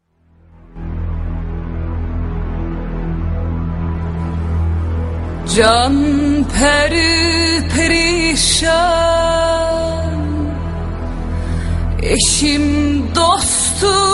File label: Turkish music box